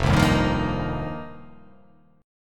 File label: F 7th Suspended 2nd Suspended 4th